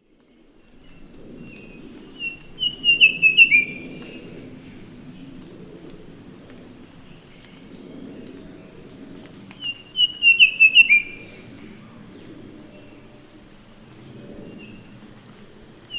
sikatan biru muda

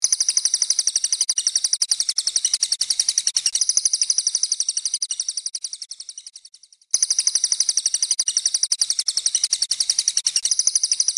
cililin untuk kacer murai cucak hijau

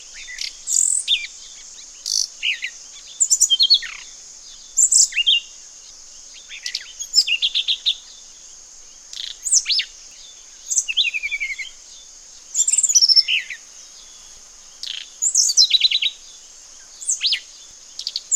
sikatan cacing

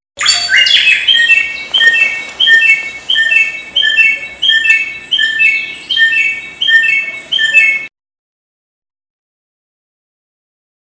audio burung samyong untuk masteran murai batu

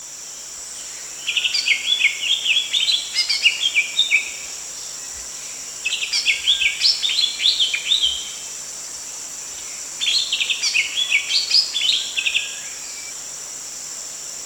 ci betina

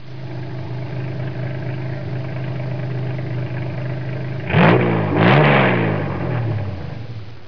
suara mobil

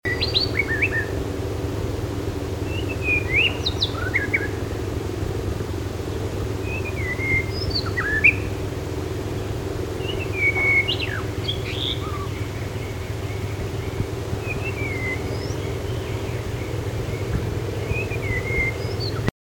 suara kicauan kacer lutung niger

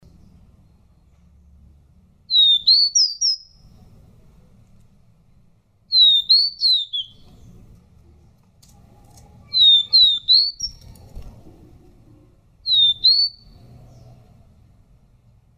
suara decu belang

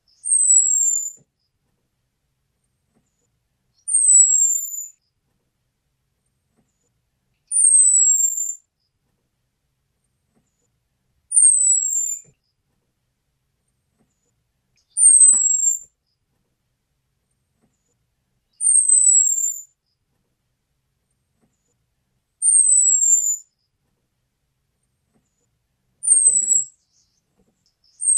suara betina anis merah

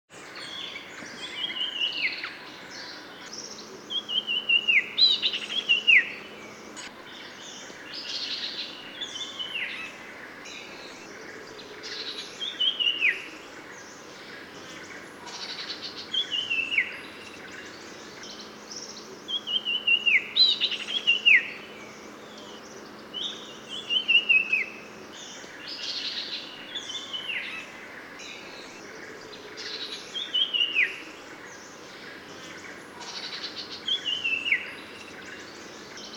suara grreat iora

